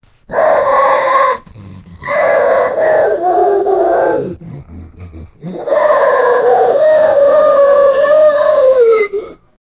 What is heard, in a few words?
angry street dog growling